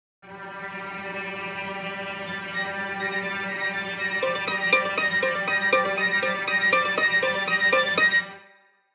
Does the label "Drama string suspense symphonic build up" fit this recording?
Yes